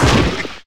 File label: Football Hit Tackle